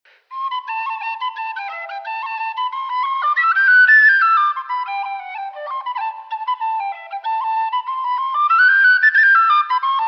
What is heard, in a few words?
Blackbird Hornpipe, whistles; Freeman D